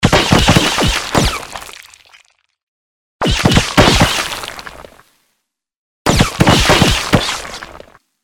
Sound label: guns explosions bullet impacts multiple